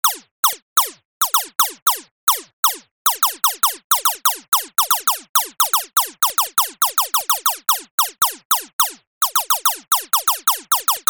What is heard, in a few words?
leisure video game retro laser gun fire multiple game action